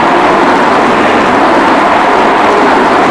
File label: road skid int wet, Kart Tires; AOS RF1 Kart Demo